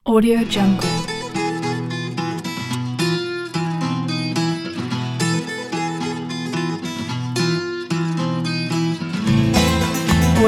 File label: greek bouziki